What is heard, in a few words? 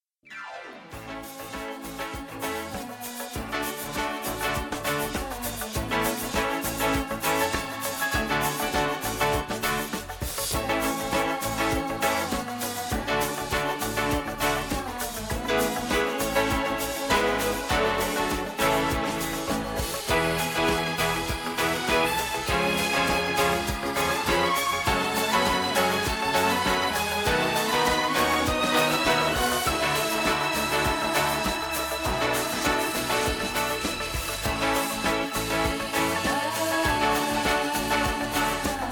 No Vocal